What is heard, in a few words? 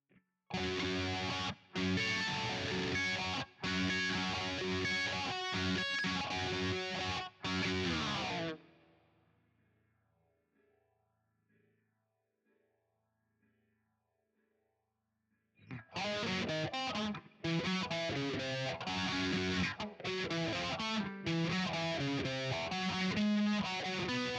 Guitar